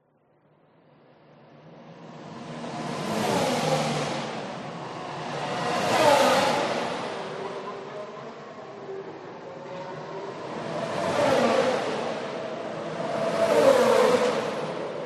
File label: f1 race track pass speed